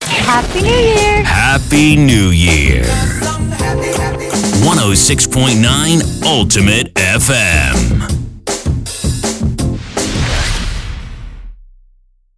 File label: HAPPY NEW JINGLE